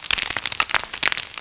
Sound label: rock fall little